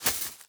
environment zone metal thread hit

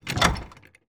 object chest open

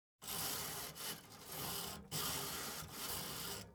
ambience room butchery working layer

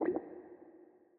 zone gas blop

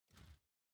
building destruction stinger

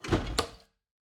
ambience room secret room chest

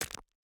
environment pickaxe hit stone

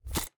ui submenu units close

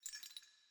ambience room chain base

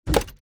ui corridor construction plan place

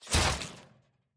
Drone Laser Metal Impact; TANK; 3Souls

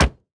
environment hammer hit

